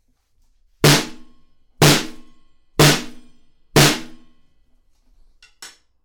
Snare; NT3; Chandler EMI REDD47

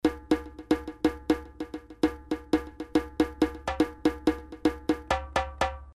Mooer Synth Drum Patch Djembe

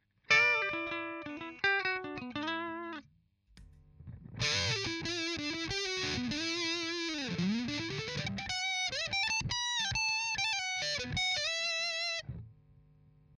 Bridge Sustain

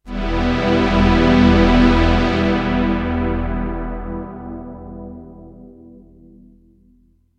Pad Release Time 6 sec

